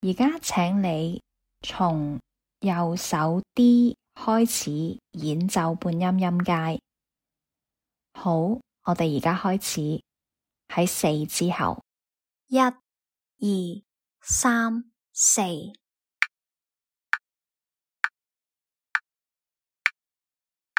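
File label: chromatic scale starting on d rh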